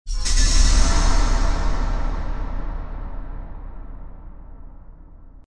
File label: Horror